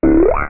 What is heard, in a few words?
PHASED, ELECTRON; Easy Personal Web Page